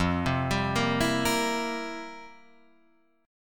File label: F Major 7th Suspended 2nd Suspended 4th, F, down, Guitar, Open F; FM7sus2sus4 arpeggiated; JGuitar